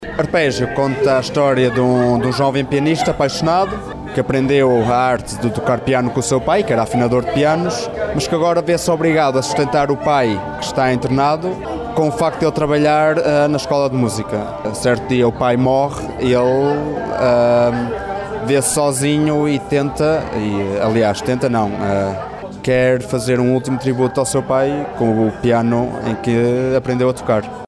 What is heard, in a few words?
arpeggio